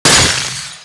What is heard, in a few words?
attribute ice; Monsters; MonsterHunter; Fight